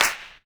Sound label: Snares, Clap n Snap